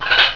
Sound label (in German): Teller